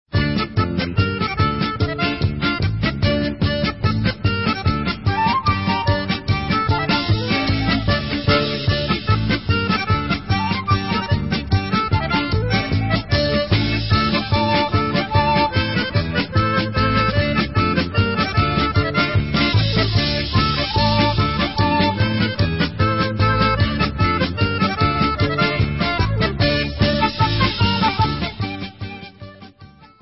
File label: Instrumental